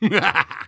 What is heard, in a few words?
laugh evil, Soldier taunts; 士兵嘲讽; 邪恶的笑声 3